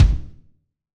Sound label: Rm Kick alt; Roland BK-5